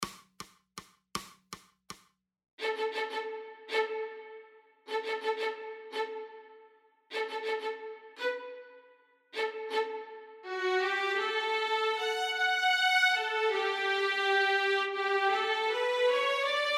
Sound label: wurligig waltz